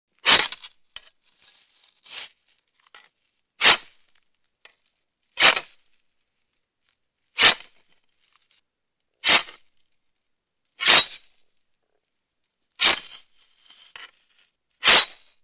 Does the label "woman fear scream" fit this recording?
No